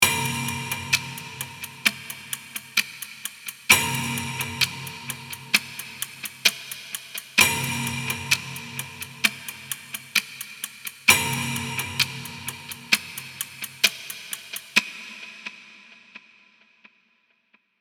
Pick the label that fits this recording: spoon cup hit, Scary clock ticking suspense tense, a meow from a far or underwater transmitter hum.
Scary clock ticking suspense tense